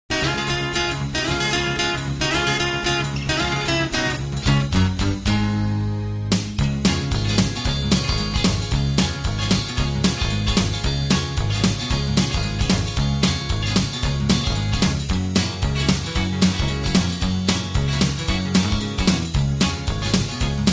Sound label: Db, backing tracks